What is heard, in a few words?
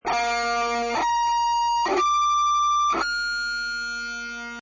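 pinch harmonics, guitar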